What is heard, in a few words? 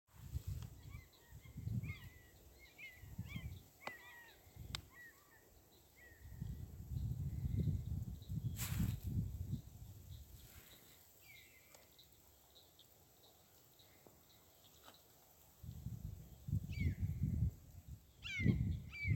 Northern Harrier